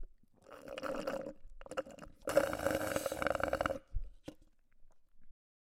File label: Slurp Sound